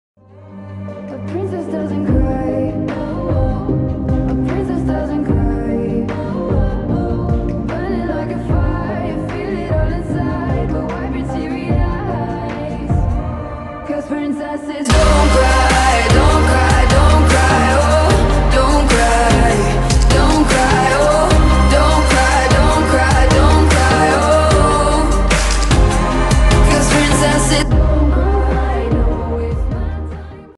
slowed korean ringtone

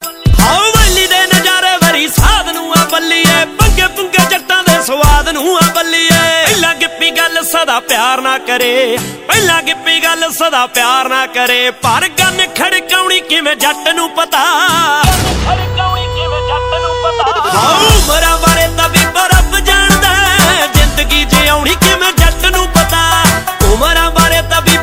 punjabi ringtone

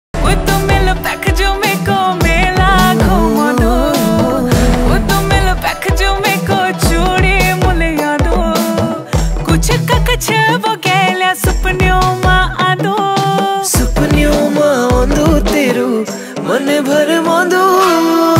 uttrakhandi ringtone

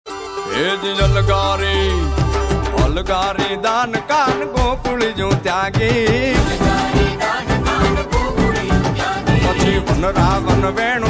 gujarati ringtone

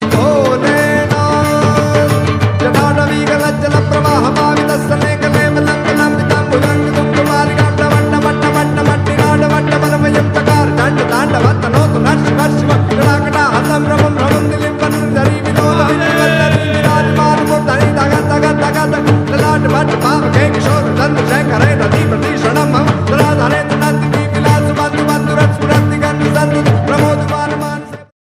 gujarati ringtone

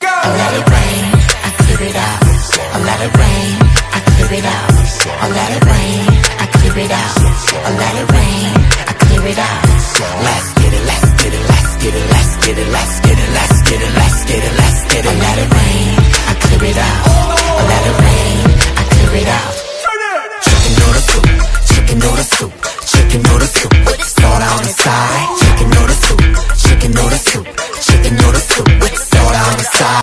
korean ringtone

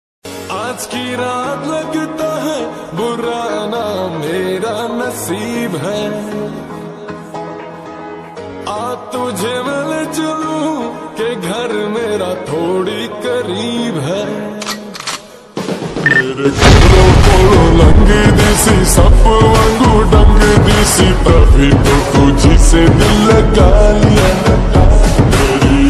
lofi remix ringtone

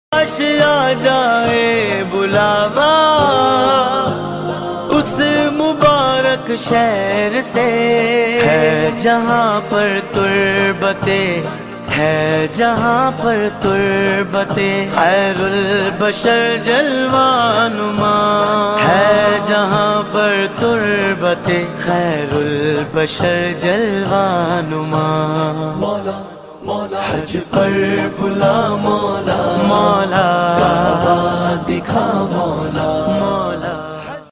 islamic ringtone

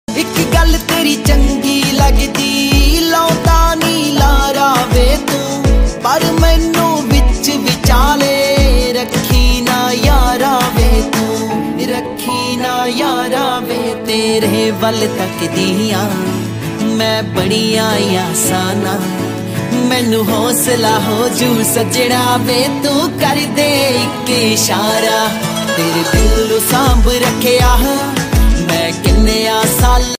panjabi ringtone

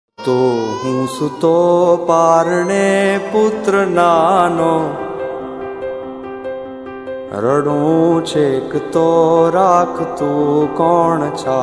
gujarati ringtone

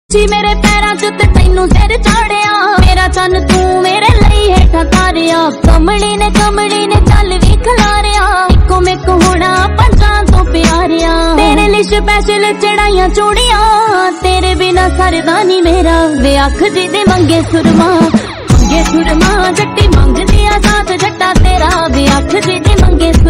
punjabi ringtone

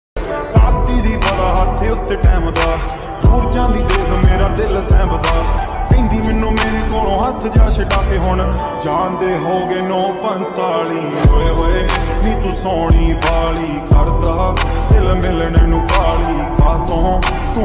slowed punjabi ringtone

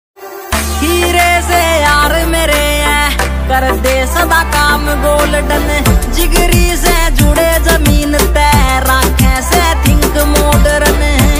haryanvi ringtone

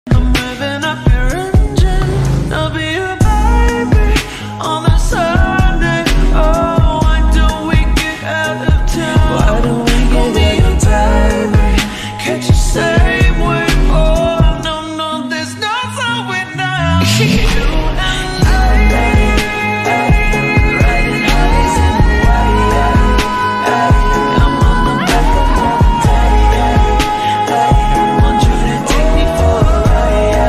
english ringtone

